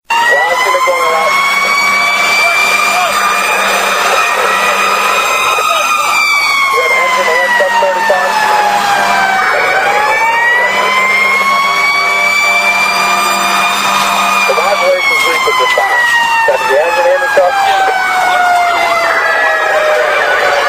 Real Q Siren

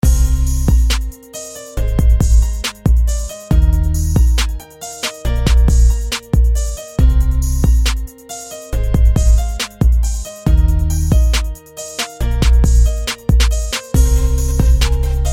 nice ring

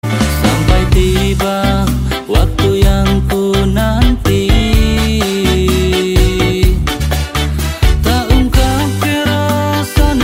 Ska

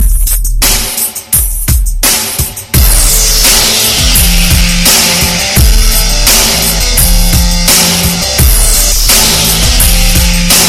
Bass Guitar

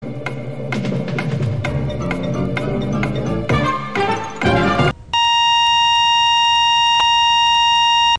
Countdown

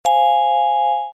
Horn Press

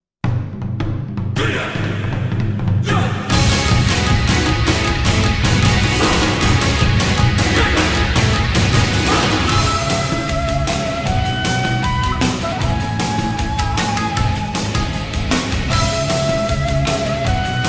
Power Up; Naruto

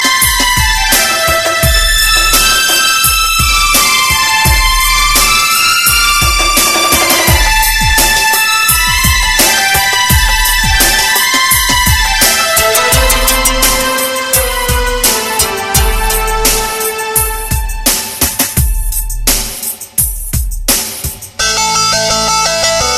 Electro; Airtel 2008

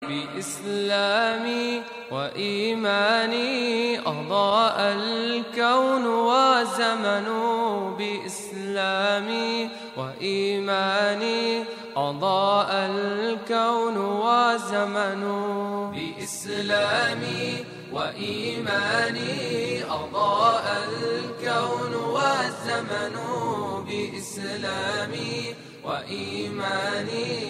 Islami

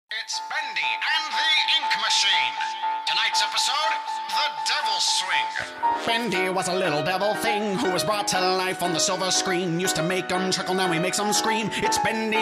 swing; Bendy

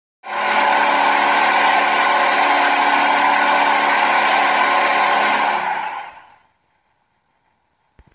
goal; Preds

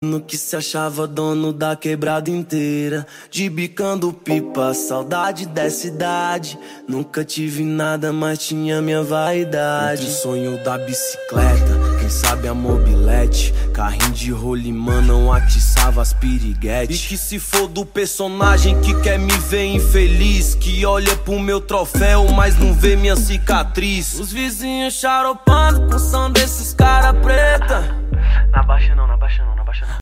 Hip Hop

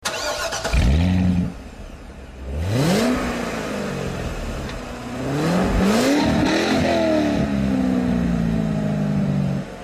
Corvette Zr1 Startup

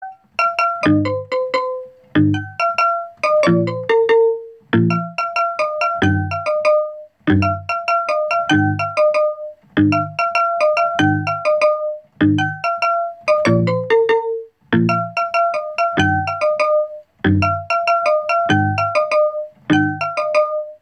marimba

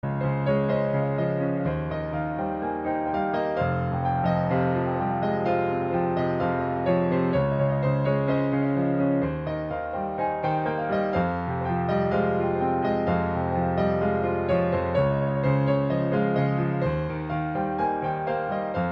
Piano Cover

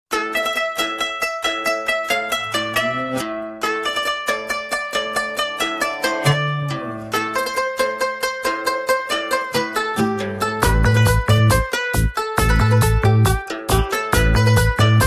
Joyful Tone

